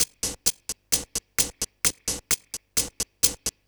Electro House, 130 BPM, Percussion; Sonic Foundry (Sony Creative Software); Underground UK House & Electro

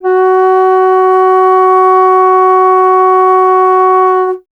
Alto Flute

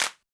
Yamaha DJX 2B, Hand Clap

